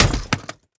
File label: weapon drop